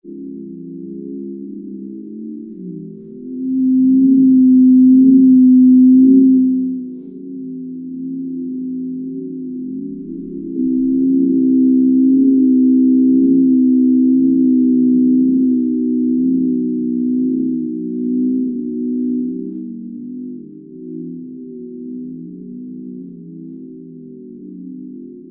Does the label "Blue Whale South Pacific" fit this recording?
Yes